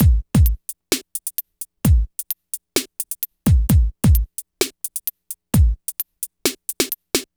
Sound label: Urban Beat, Drums